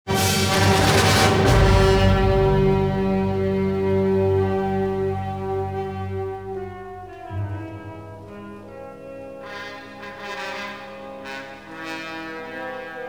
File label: swamp, music; Star Wars Jedi Knight II Jedi Outcast; XBOX; yavin swamp